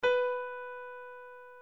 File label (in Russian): zvuk noti si rastyanutij